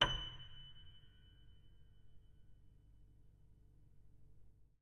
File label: F sharp 3